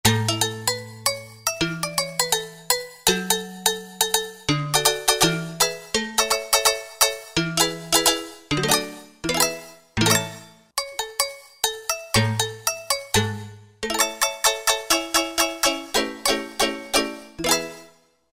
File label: Cow Vibes, Roland JD-990; Factory Patches